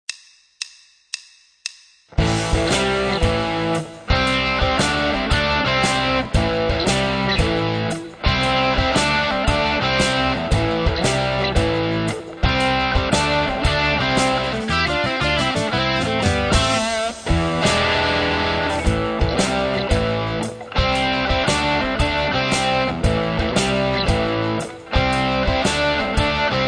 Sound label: Rock & Blues Solos; De Haske